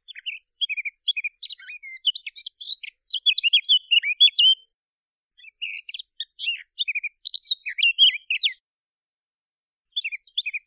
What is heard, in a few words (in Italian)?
CAPINERA